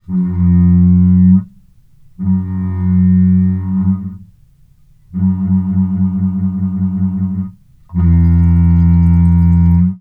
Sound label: Mooing toilet